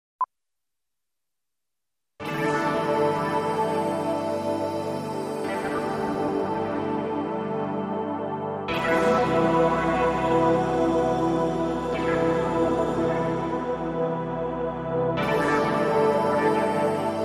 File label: Gravity Waves Pads